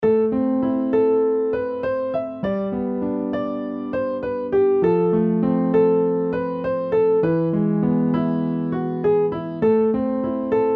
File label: Spanish Song